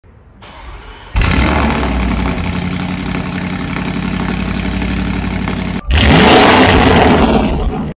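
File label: Bentley, exhaust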